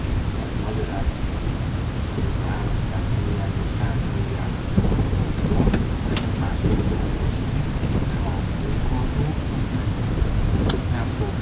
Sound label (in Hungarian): barlang párán beszéd, denevérek repülés; termeszetben